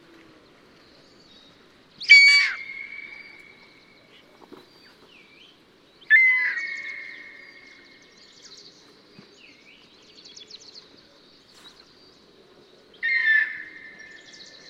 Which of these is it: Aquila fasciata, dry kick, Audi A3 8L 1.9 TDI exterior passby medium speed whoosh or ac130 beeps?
Aquila fasciata